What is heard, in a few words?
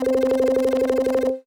Synth C, Synth Prophet One Shots; Electronisounds